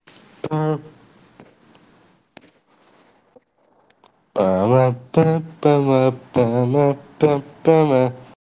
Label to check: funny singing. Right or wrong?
Right